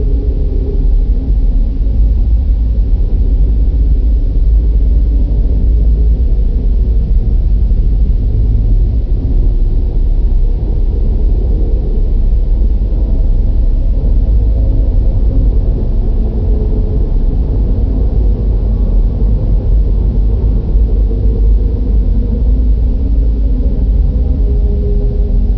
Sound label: Drone; hl2